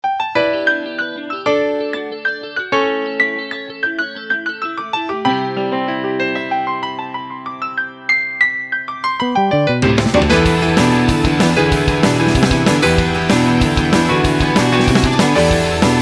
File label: Battle; Gensou Shoujo Wars: Dream of the Stray Dreamer; Lyrica